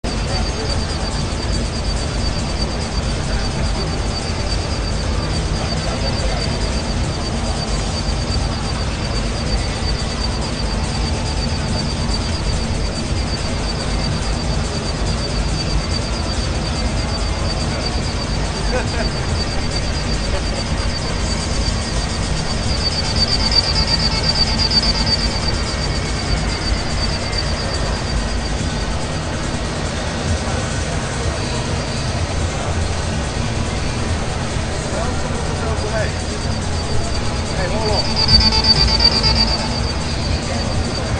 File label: ambience